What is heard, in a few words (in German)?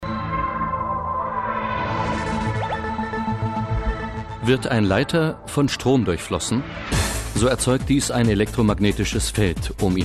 Industriefilm